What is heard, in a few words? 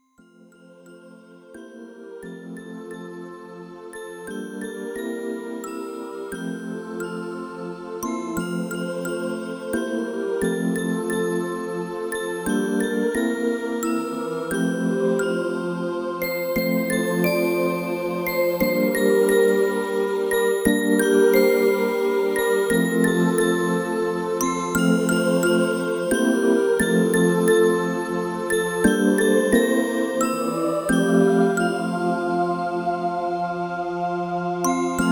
Jazz Music Therapy For Cats